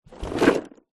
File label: household fabric drawer open storage tidy